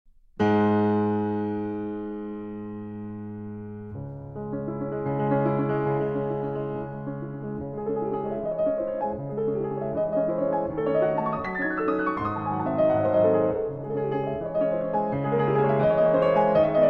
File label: Fantaisie Impromptu in C-Sharp Major, Allegro Agitato